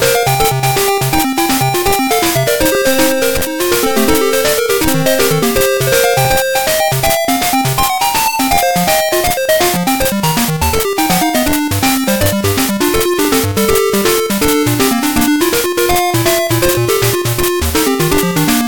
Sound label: Bike Race music